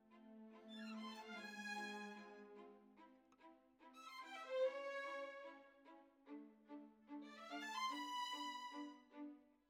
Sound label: Violins, jazz for piano